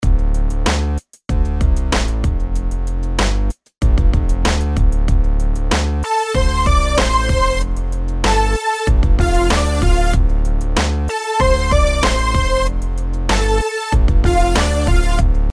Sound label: rhythms eighths